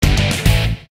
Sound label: Punk instant message; Nokia; Audio themes